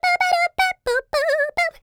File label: SCAT, Jazz